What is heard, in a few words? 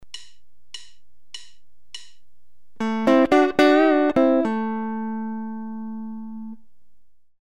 Double-stop bend and release, guitar